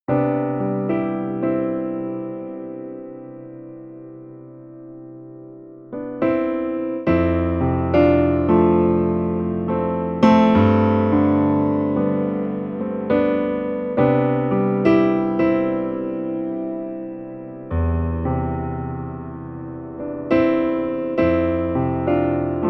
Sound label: improvisation